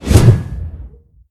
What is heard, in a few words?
whoosh short; gundyr